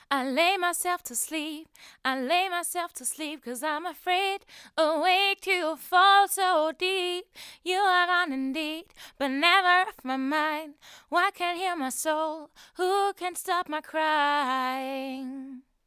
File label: vocals